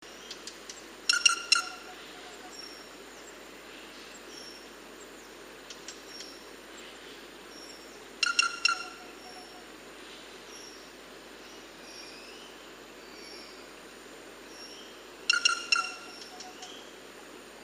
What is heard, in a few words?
White bellied Treepie Dendrocitta leucogastra